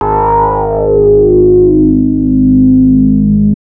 Anasound Long to G, Industrial Analog Single Notes; Sonic Foundry (Sony Creative Software)